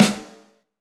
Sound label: 90s hiphop snare; Reference beat bpm90